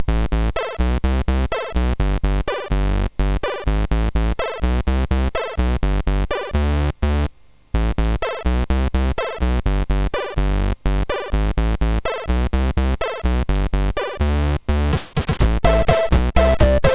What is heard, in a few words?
sid, MOS 6581R4